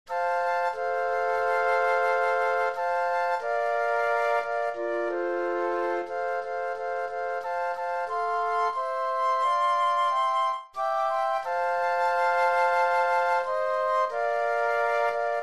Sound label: Flute Trio